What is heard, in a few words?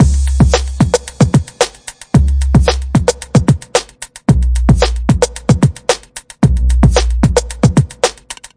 clicky boomy break, 112 bpm